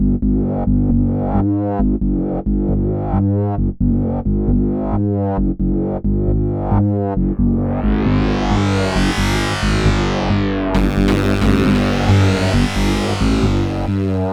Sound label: Trancefunk Mutator a, Hardcore Breakbeat 134 F#min; Big Fish Audio; Hardcore Breakbeat Action